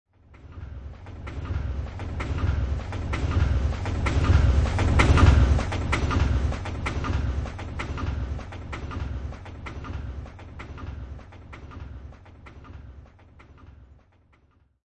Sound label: Train Passenger Train Pass By, Passenger train drive by.